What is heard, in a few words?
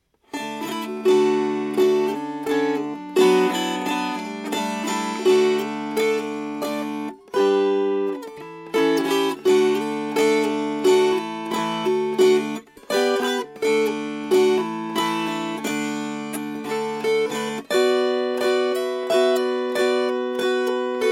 Strummed